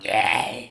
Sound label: argh; Infernal Radiation; baal